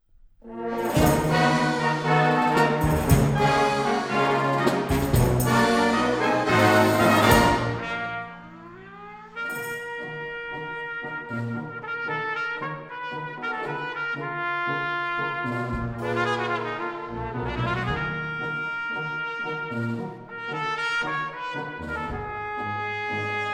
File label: Tango, Brass Band